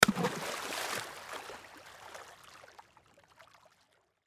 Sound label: Water Splash Object Drop Rock; Water Splash Object Drop Rock In Pond Waves; Splashes (Sound Effects:Objects); Blastwave